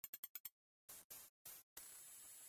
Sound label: Jingle; Sega Channel Demo Cartridge #4; Genesis